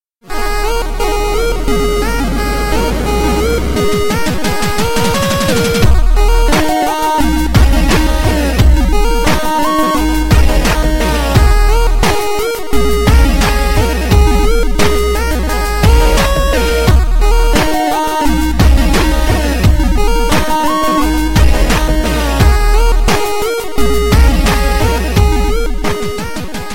8 bit remix

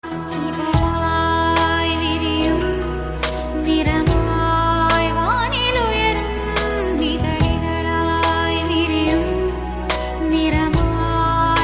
college song

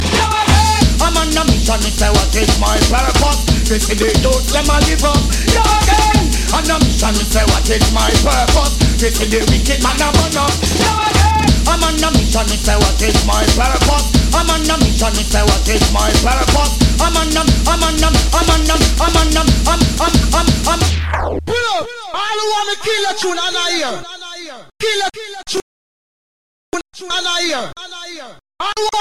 ragga jungle

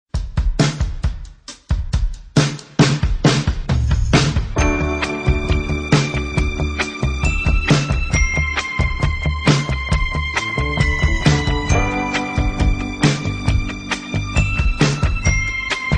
pop orchestra

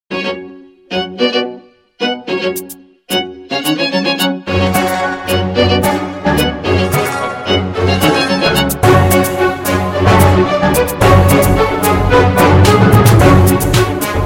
strings on play

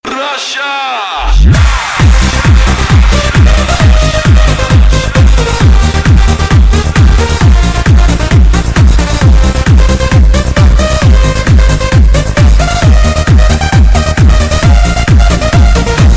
russia techno

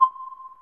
notification; playstation portable

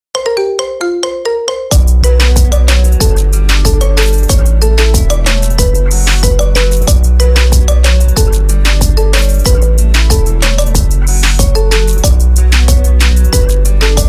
marimba remix

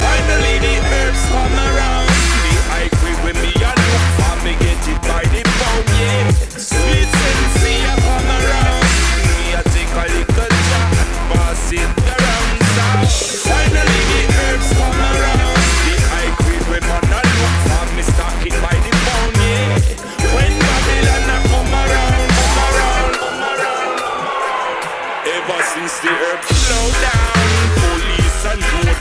dubstep remix

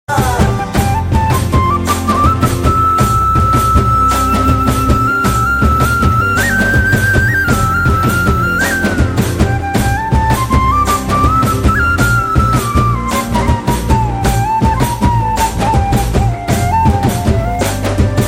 flute

